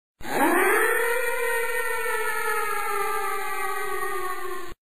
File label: berserk roar; eva-01